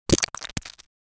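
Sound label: imp creature for a videogame; characters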